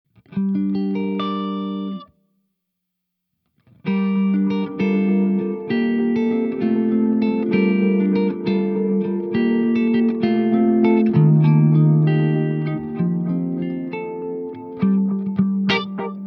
Vintage Oil Can Echo, The cascading feeling here comes from a longer Time value with Both heads selected.; Dry and Wet mixed in Pro Tools; Falling / Leaves; Olivera